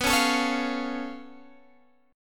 C sharp Augmented 7th B

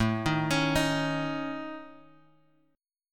A Major Flat 5th A, chord; AMb5 Chord {5 6 X 6 4 X} arpeggiated

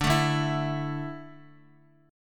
D Suspended 2nd D down Guitar, chord; strummed

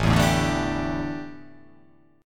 A 7th Suspended 4th, A, down

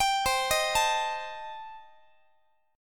C Minor 6th Guitar, chord; Cm6 arpeggiated; x,x,22,22,19,20

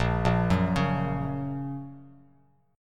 Bb Minor Sharp 5th Bb down Bass Standard; arpeggiated; JGuitar

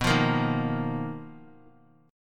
B Major 7th Flat 5th B down, chord shape; BM7b5; strummed; JGuitar

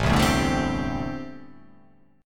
A Minor 11th A, Chord

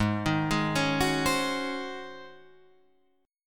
Ab 11th Ab Guitar Drop D; arpeggiated; 6,6,6,6,7,8; JGuitar

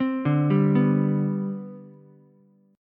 C Major 7th Suspended 2nd C down Ukulele, chord; CM7sus2 arpeggiated; JGuitar

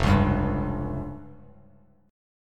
F Suspended 2nd Sharp 5th down Bass Standard; Fsus2#5 strummed; JGuitar

